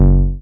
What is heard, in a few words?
TB G2; Best Service Gigapack 1; SYNTB303